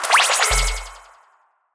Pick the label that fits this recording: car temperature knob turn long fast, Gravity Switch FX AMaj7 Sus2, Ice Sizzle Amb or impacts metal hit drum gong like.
Gravity Switch FX AMaj7 Sus2